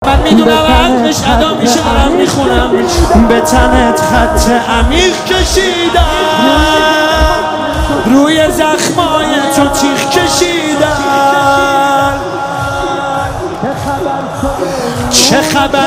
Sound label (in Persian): مداحی